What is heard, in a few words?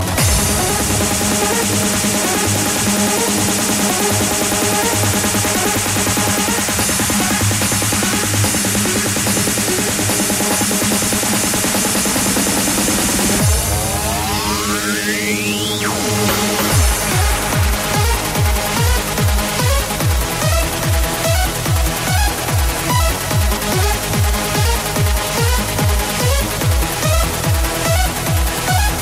bas boost